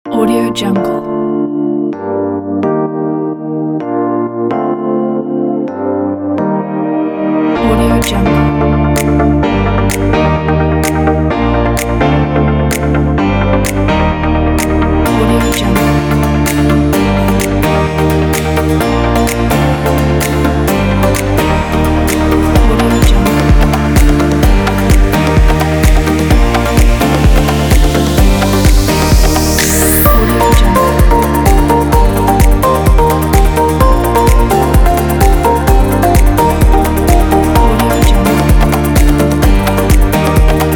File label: pop